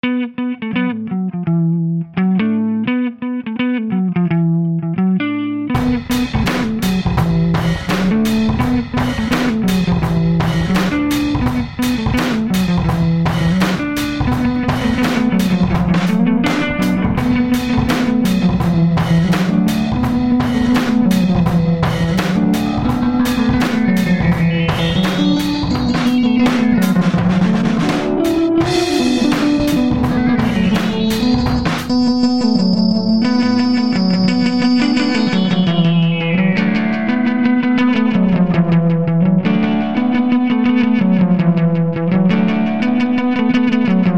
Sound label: funk guitar